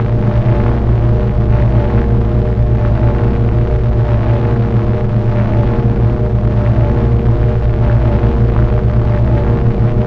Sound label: fusion reactor, ambience; vendetta